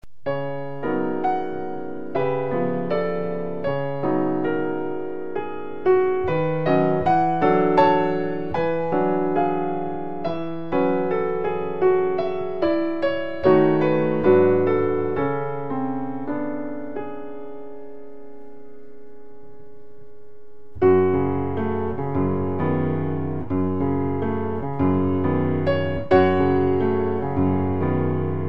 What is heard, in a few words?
WALTZ